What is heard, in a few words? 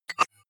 hover fast, signale; soundpool